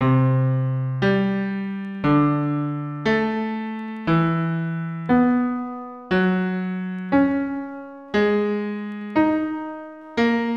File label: Circle of Fifths and Major Triads